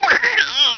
death; sc toysoldiers; fgrunt

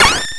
bullet hit; svencontra2

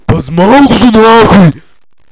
zo pain, zombie; svencoop; cracklife

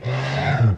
gonome moan; svencoop; lostmaze

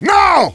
357 cock, weapons; svencoop; cracklife

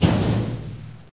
player duct; mistake coop

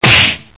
strike attack; uboa rampage ii

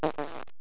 zap, debris; svencoop; cracklife

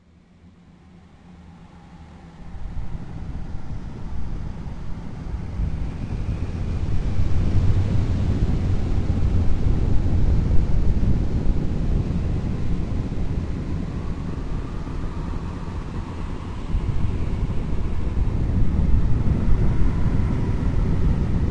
wind cave; svencoop; arctic incident; ambience

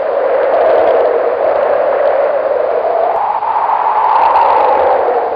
wind; svencoop; legends

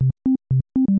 bgm loop; uboa45

